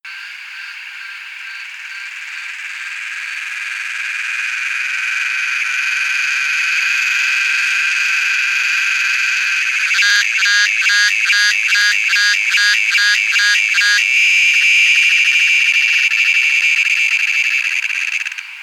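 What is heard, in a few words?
Psaltoda moerens whole song phrase, cicadas; AU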